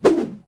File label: foot swing; CosmicRage; general combat